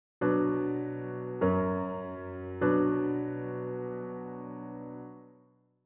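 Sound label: B minor perfect authentic cadence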